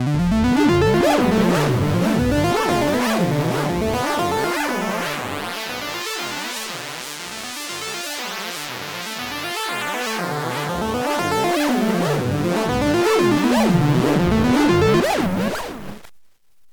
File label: Black Hole DSP2 Synth Chirp delay delay time CV; Erica Synths